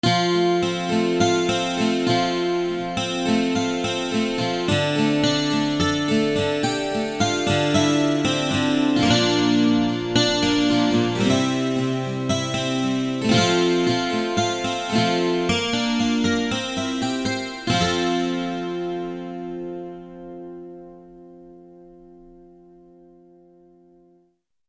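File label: 12 String guitar; GTR-12 String; Roland L-CDX-01 Rhythm Section Instruments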